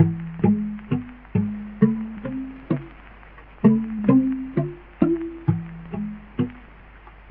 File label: guitar, Jazzmatazz Loops